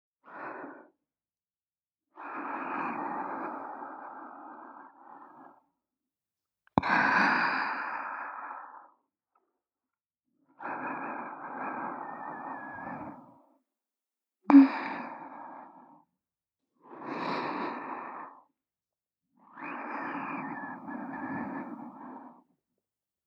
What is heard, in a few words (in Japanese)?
サキュバスな新妻の寝息 (左)